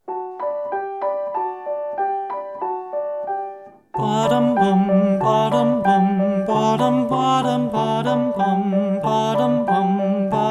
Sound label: french folk song